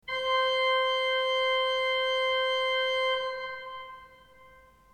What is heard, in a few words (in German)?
ton, orgel